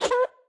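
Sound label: Chicken base attack, 经典及以上形态攻击音效; 母鸡会用自己的喙不断地啄对手; 绿野世界; 卡通农场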